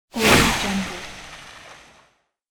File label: water splash transition hit